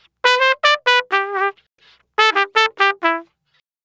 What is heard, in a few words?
Trump Solo; brass